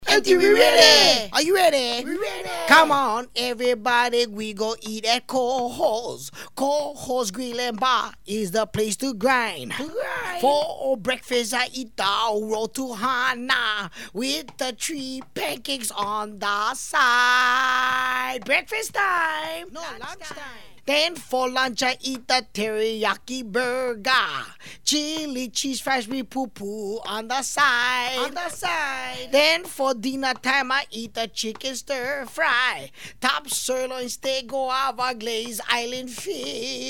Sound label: chant; kohos